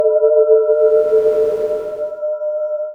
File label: Gong alike; Florence 2018